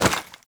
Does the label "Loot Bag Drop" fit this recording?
Yes